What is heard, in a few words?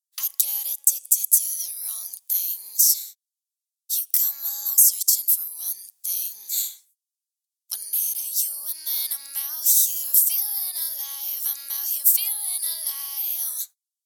Vocal solo